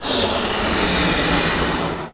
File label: blow; BESTSHOT; noisybit